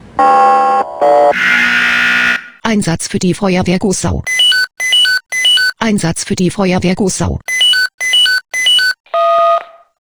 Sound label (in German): SMS Alarmierungstone; FW Gossau